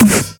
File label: Transient Snare